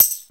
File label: TAMB HIT, TAMBOURINE